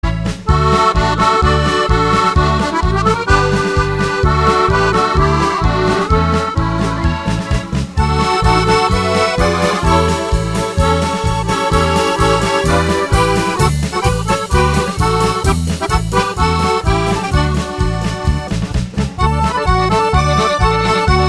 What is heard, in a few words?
Polka